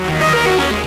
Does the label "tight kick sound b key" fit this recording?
No